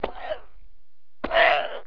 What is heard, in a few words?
people